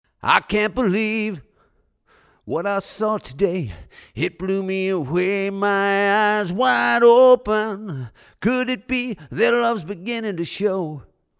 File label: Vocalist Untreated Room